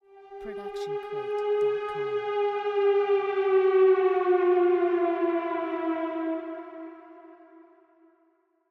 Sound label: Sirene Falling; soundscrate